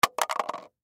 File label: Band-Aid Box Drop Cement; Drops; Objects; Blastwave; Foley